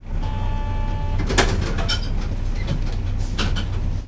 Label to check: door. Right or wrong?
Right